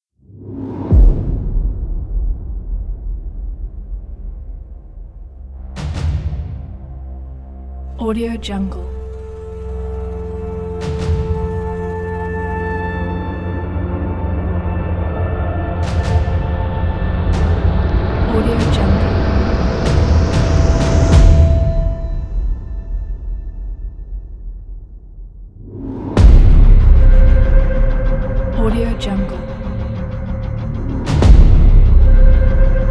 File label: game trailer